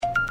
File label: Beep Low